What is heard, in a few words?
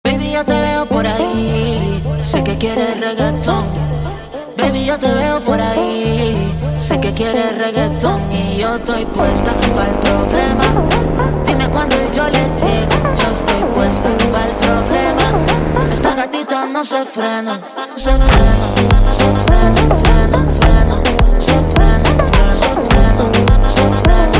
super Slowed, funk